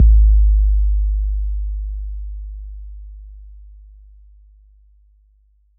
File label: BD 808 Decay